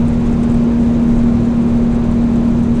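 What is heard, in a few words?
indoor loop; Garry's Mod; tf2; cp harbor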